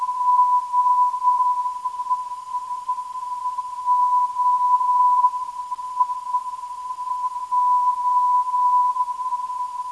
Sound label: 275kHz, ndb